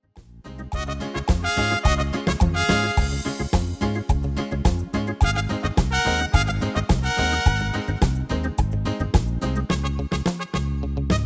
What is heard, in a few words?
MIDI